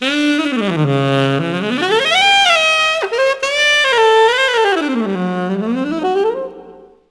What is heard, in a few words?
alto saxophone